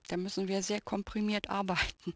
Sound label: laugh; The Phonetics of Laughter